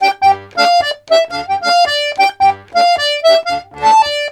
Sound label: RONDO, Accordions; USB Soundscan vol.40; AKAI